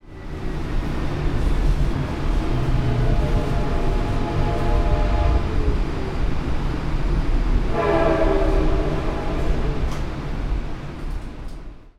Train Whistle Doppler

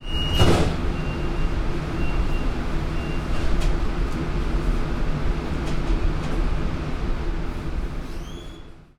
Trolley Door Slam

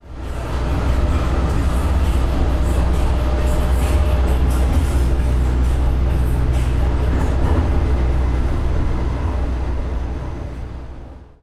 Trolley rumble Cel Phone Beat

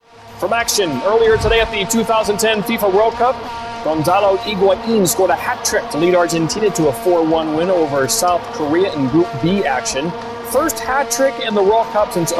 Vuvuzela filter denoise

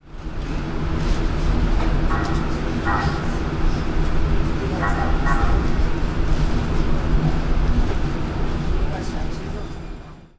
Trolley Horn

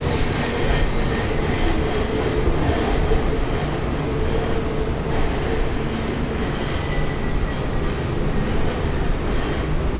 Rail Screech

